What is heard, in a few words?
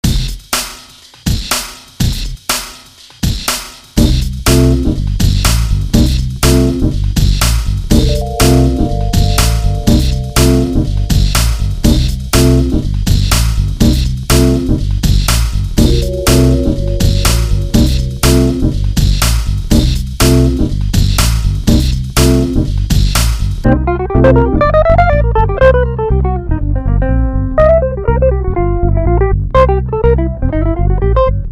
funky beat